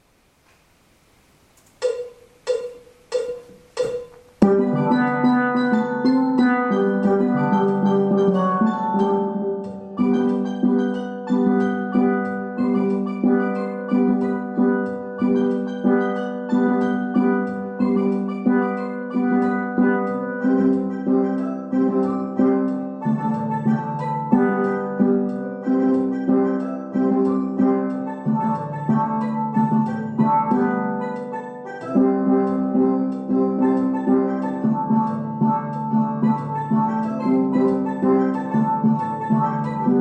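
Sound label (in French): GUITAR, Steel Band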